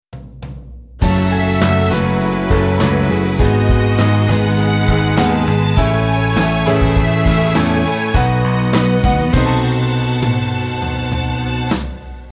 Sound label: V altered b9